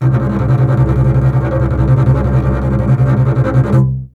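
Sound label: string contrabass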